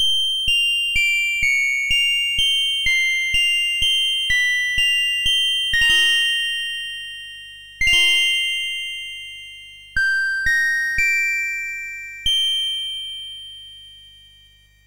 Game Music, sid MOS6581R3

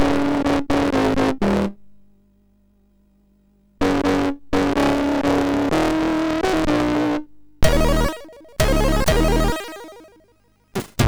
sid, MOS6581; hvsc

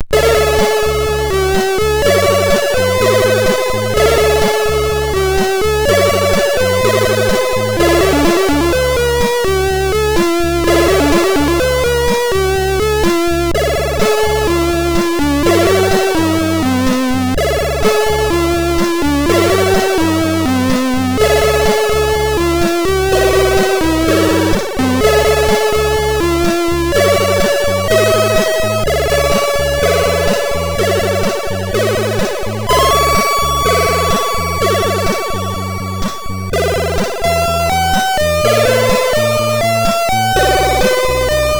music, sid MOS6581R4

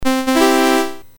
BASIC sid MOS6581R4, GAMES; Sword of Fargoal

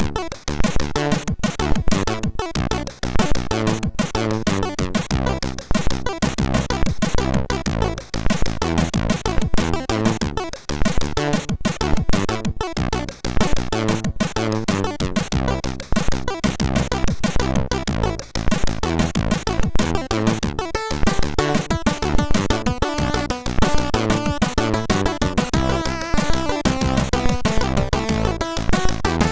Funny, sid MOS6581R3

